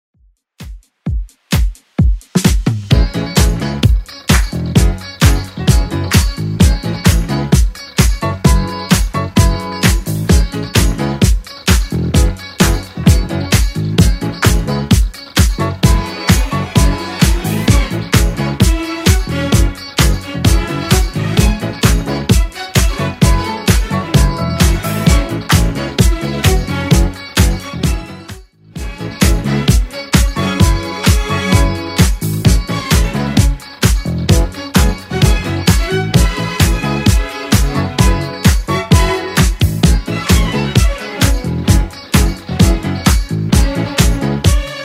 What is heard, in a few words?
80s Redrum 130bpm